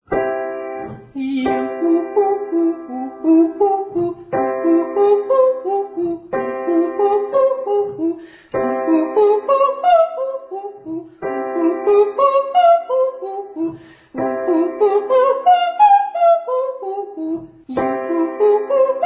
Triads Octaves Ascending To High D Flat

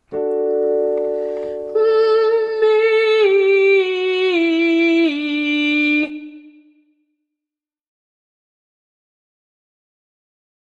Legato Hmmmee Descending

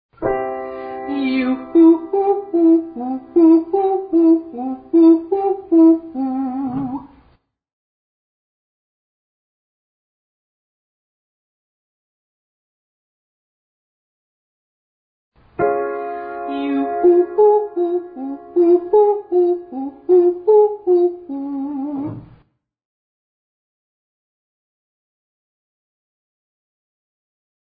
Yoo Triad Arpeggio Descending

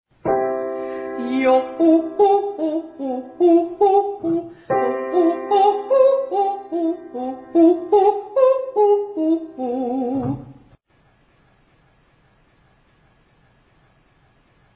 Triads Yoh Descending

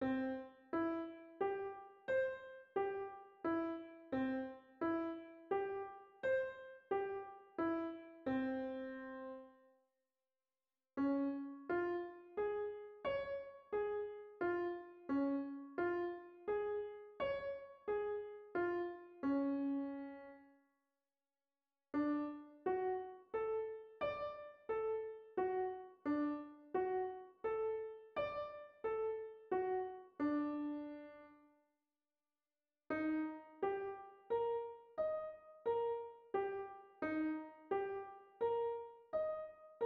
staccato octaves ascending c4 to c6 44 bpm

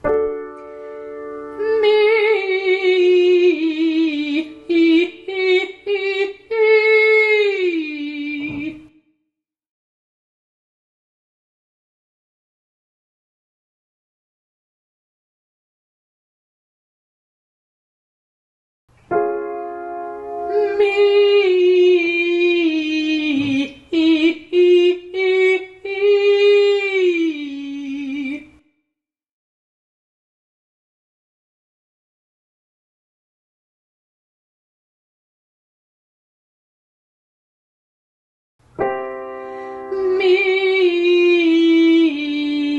Legato Staccato Cry Mee Descending To Low E

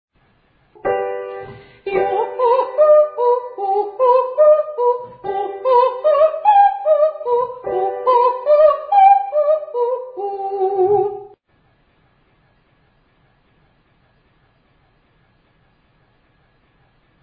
Triads Yoh Ascending Very High

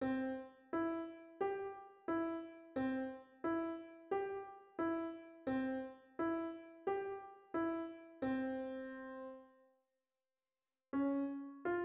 staccato triads ascending c4 to c6 44 bpm

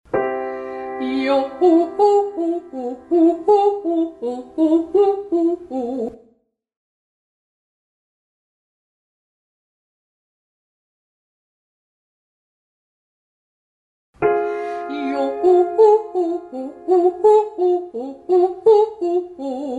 Triads Yoh Ascending

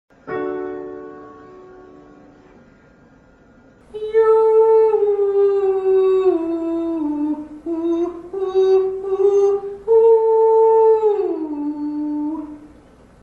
Legato Staccato Cry Yoo Ascending

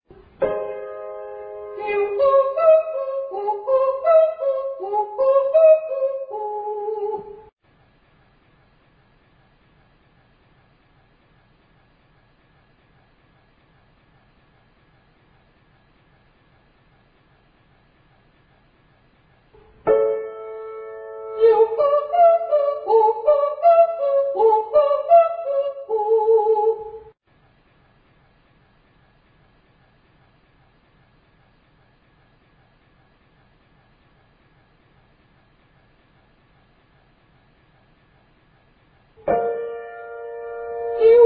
Triads Yoo Ascending Very High

